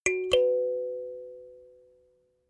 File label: notification kalimba fourth up